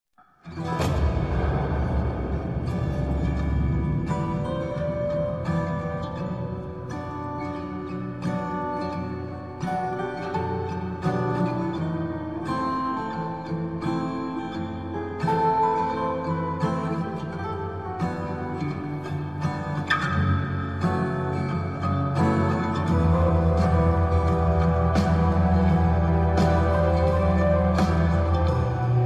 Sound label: sad bgm ringtone; aavesham movie